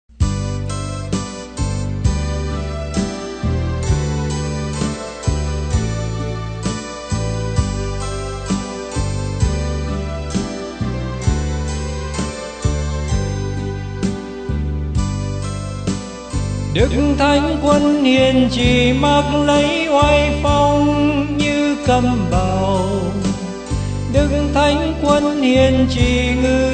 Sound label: BASS